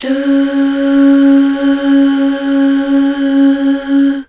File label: DUUH, BACKGROUND